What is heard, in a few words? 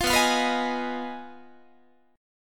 B 6th Flat 5th, down, Guitar; B6b5; strummed